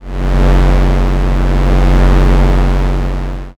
space engine

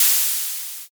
spray

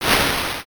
swoosh

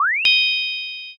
light magic

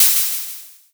open hat

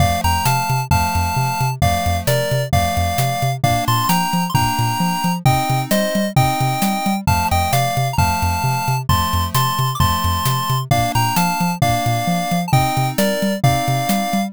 bgm street

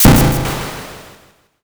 thunder magic

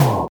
golem hit

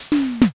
fridge

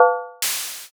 basket

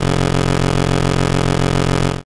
buzzer open

